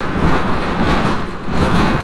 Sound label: large plastic sheet flapping in wind